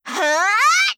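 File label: attack, Voice Line, Korean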